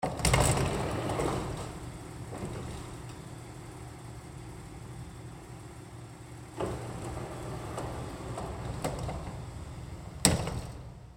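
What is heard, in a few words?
Door Automatic Sliding Store open-close; Sliding Doors; BigRoomSound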